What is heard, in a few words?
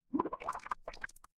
water bubble